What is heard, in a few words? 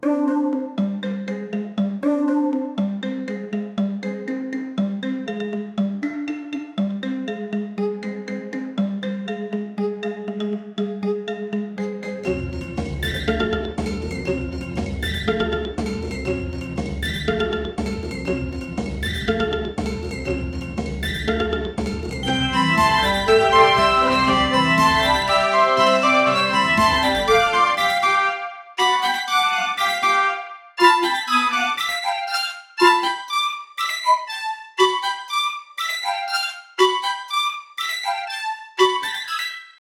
playful magical orchestra